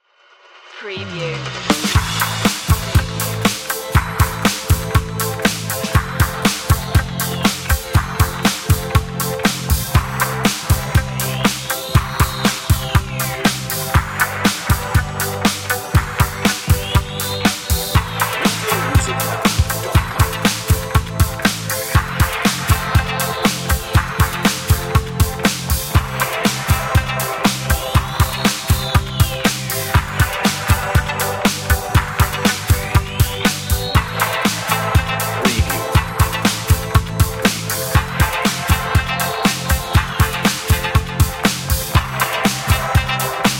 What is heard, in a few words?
inspiring rock